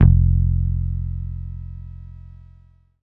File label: BASSES, Roland